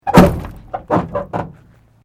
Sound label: Metal Light Movement Minor; Material components to create more complex sounds; Materials